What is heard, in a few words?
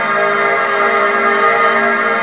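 G#4 air vox; Northstar - The Wizard VOL-1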